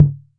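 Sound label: 8000 conga, Roland CR-8000 drum machine; DRUM MACHINES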